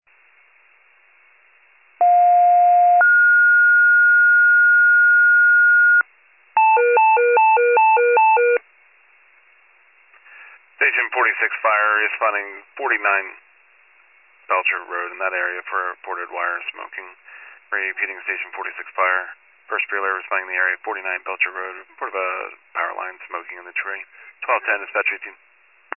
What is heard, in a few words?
FIRE pager alert